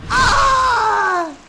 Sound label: moan